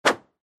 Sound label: Motion Swish